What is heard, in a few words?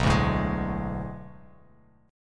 B Minor 13th B down Bass Standard; Bm13 strummed; JGuitar